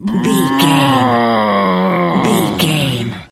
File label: voice male growl